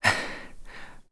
Recover Low; Link recovering from slightly low stamina; Skyward Sword; zelda

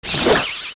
Die; Mini Floormaster; zelda; OOT

